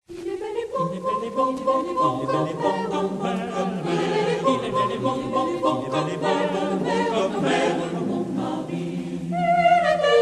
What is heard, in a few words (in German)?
alle Stimmen; il est bel et bon; pierre passereau